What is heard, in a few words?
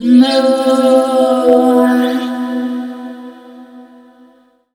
VOICE FX, Dance Vocals; Best Service ProSamples vol.12; AKAI